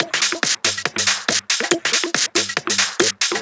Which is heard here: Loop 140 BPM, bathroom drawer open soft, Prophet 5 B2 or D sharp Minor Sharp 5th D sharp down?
Loop 140 BPM